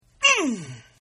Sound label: Grunt; Partners In Rhyme